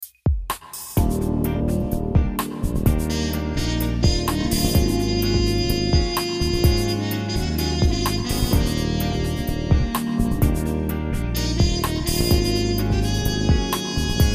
Smooth Jazz

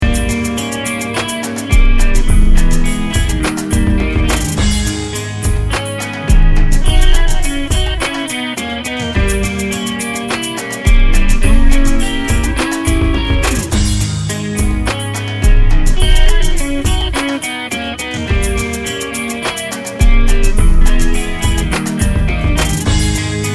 clean krank

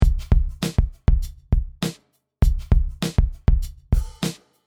Urban Beatz 100

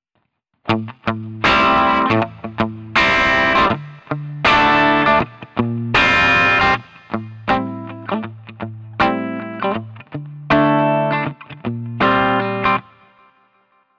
AC30 mellow crunch

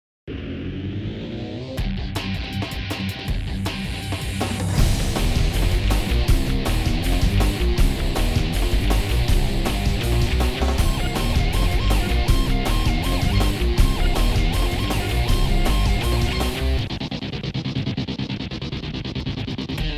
Boogie; GT-6

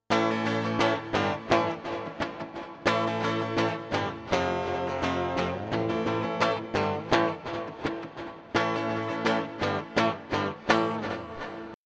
Strat Bridge PU Killer Echo; PerfectSpace

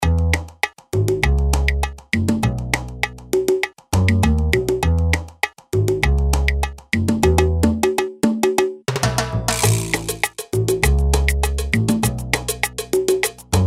Salsa

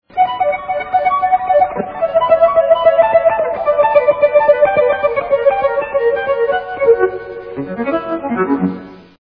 vio arp

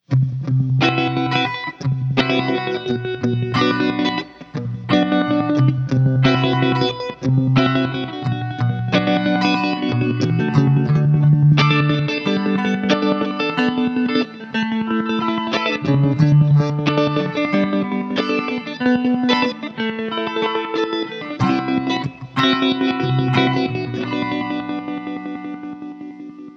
Shake Mode; GFX CHOPPER

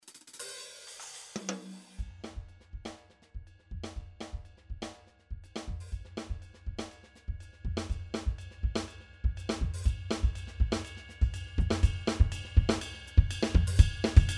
Strike Seamless Intensity

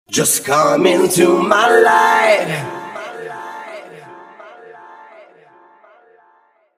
Back Vocals WITH str

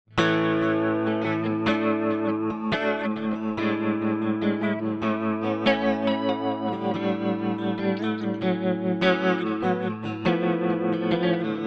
Elec Gtr Trem Mod Echo

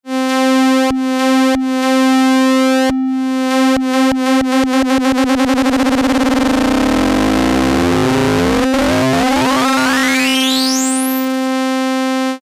audio rate wave mod

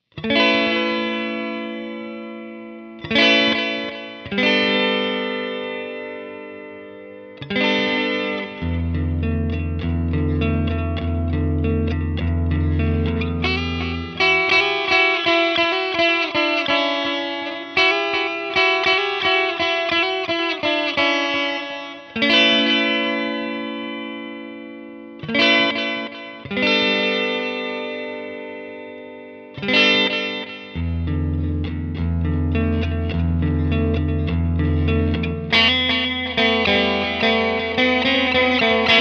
Clean Short; Replica

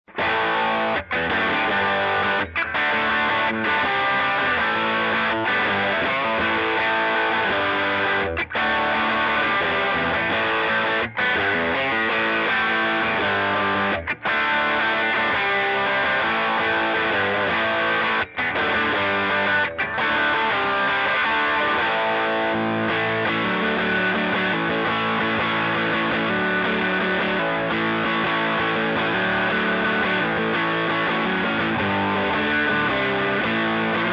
vintage rat strat bridge neck rock

